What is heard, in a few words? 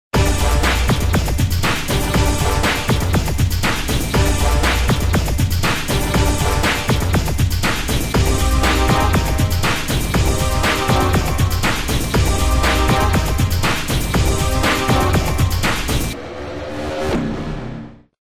spy, ringtones; Microsoft